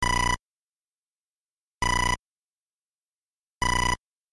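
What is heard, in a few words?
timid phone ring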